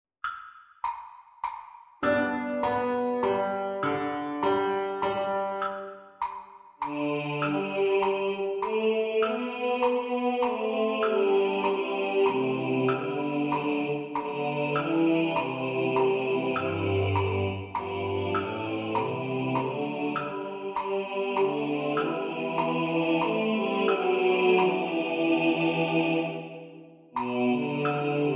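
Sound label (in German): Bass2 im Vordergrund mit Metronom, Männerchor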